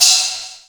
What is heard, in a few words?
hubcap, Sound Effects